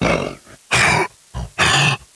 Clot Roam, Elf

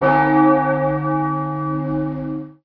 Dark bell

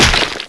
snipe hit

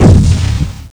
thrower fire A, Food Fight Weapons

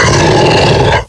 Pound Attack

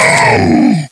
Clot Pain, Elf

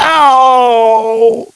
Gore Death, Ginger

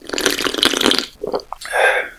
Coffee Slurp

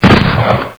can opener Fire

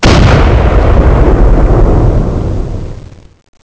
go blast

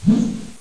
Swoosh

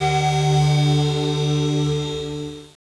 DD Alarm

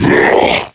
Attack; KF Christmas; Patriarch

